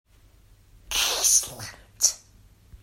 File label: glass sound